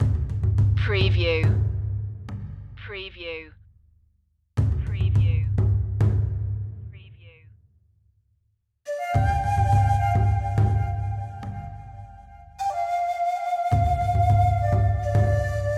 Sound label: asian dream